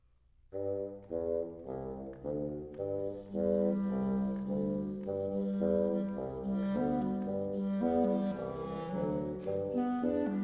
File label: Diversions For Wind Quintet, Promenade, Brisk